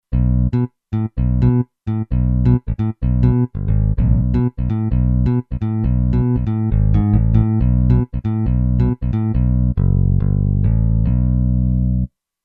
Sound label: Ripper Bass, Kurzweil K2500X; Factory Programs